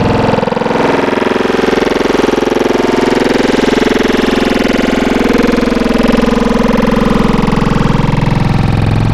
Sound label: Growl Wavetables; Horricable; Cymatics; SERUM; Virus Wavetables